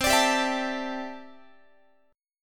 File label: C 6th Add 9th, C, down, Guitar; strummed; x,20,17,17,20,20; 4A,4E,5A,5D,5G#,6C#; chord; JGuitar